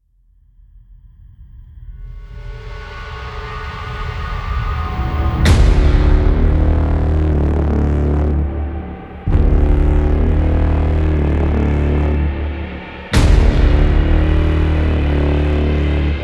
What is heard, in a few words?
Main Ambient